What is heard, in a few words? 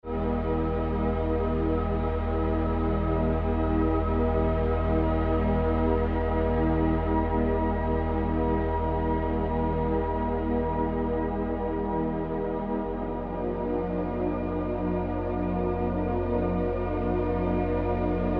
lemuria healing sounds